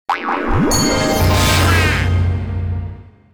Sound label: Big Snowman